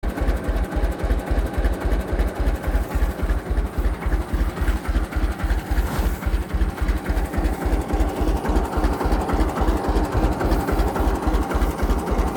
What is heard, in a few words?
running baler tailgate